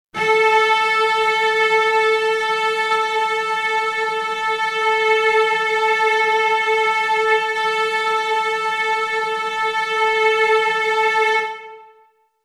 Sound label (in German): Sinus 440Hz Violine